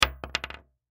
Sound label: Bullet Shell; Bullet Shell 223 Drop Wood; Drops (Sound Effects:Objects); Blastwave; Warfare